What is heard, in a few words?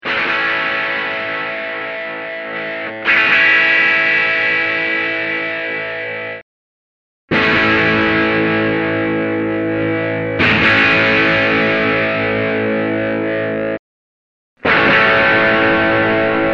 Gtr Knob Test